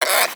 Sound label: Vocal Tranquilized Grunt, Critters; Characters; Warframe; Cryptilex; Hunting Critters